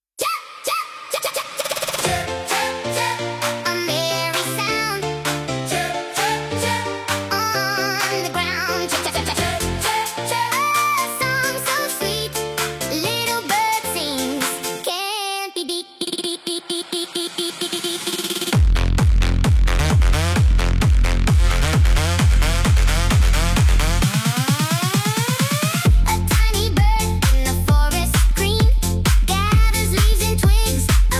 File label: Chirp Chirp